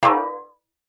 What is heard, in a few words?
impact metal light hit cooking tin water inside wobble pitch